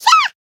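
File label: Voice damaged, Japanese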